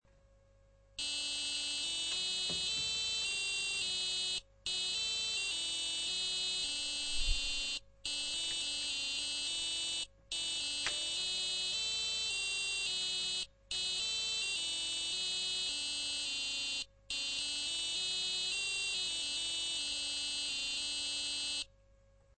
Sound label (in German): tuerglocke; doorbell